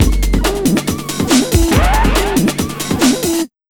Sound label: Big Beat Drumloops, SPEED 140; USB Soundscan vol.25; AKAI